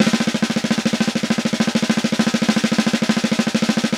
Vintage Snare Drum, 3.5X14; Q Up Arts; Johnny.C's Vintage Snare Drum

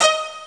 violin; ST-XX Amiga Soundtracker Sample Packs

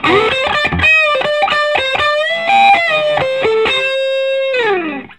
SOLO, Vintage Blues Guitar

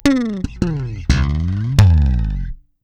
FUN FILLED, Bass, 80 BPM; Sampleheads; Ultra Freakin' Bass

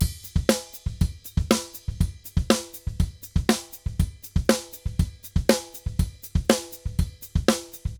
Drums Samba 120 bpm; Best Service; Festa Latina VOL-1

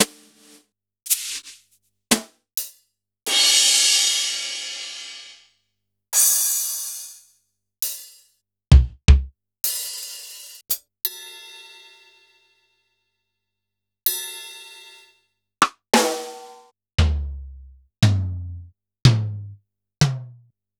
Kurzweil Kit; Sliced

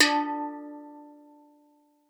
STEELDRUM D3; Xsample VOL-4

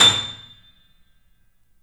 The Piano A; InVision Interactive - The Piano

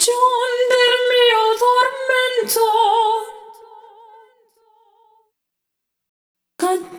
Tormento, vocal samples; Sonic Foundry (Sony Creative Software) - Pocket Diva World Class Vocal Samples; Op-Erratic Loops

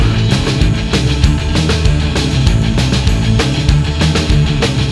band track; Vermin; Sonic Foundry (Sony Creative Software); Ugly Remnants VOL-2

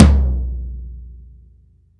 TOM E, Drum Dry; East Collexion; Drum 1

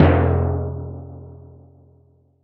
Timpani, Percussion

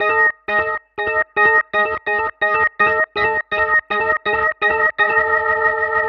ORGN 123, Keys; Houseworx

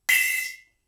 Splash, drums singles; The Roots Drumloops

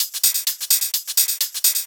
Hihat Loop 128 BPM

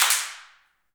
handclaps, Heart of Africa

